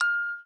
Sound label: pinball sensor